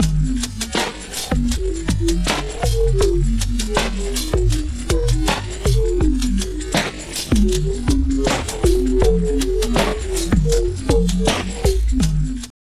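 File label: drum loops crazy processed, 80 bpm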